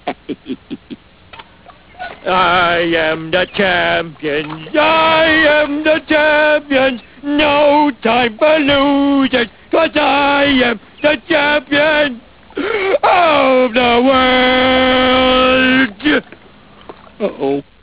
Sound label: champions